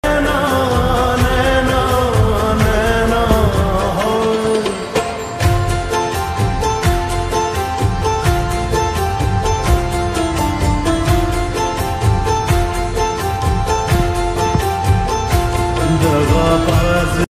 new ringtone song music ringtone hindi song ringtone